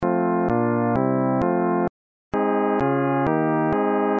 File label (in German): viertelmitteltoenig